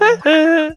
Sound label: eh uh notification